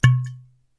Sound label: uncork bottle, cooking; 栓を抜く１